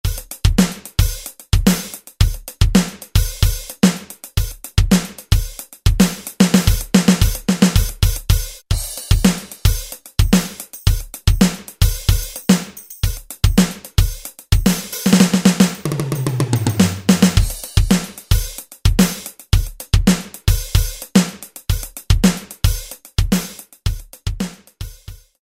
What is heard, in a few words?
Funk, Alesis SR-16; Preset Patterns